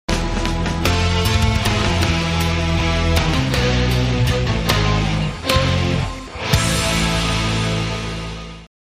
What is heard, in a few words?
Oriental Dawn